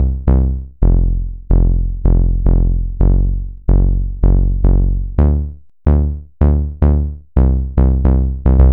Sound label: Bass Line; Eclectic Electronica